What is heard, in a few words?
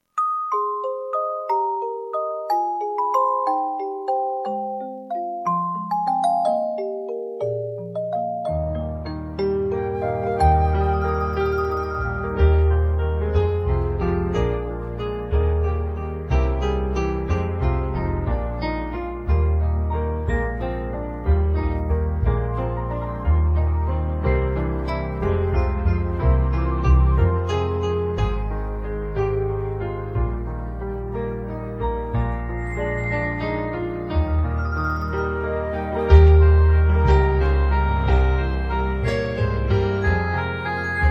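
backing track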